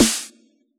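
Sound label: snare drum T9, Yamaha DJX; 155 DJX Kit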